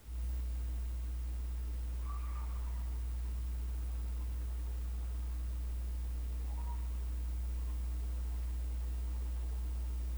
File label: classroom scream; Electronic Voice Phenomenon; statehouse